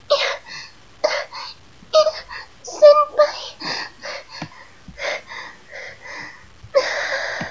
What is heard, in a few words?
happy girl laugh; flipper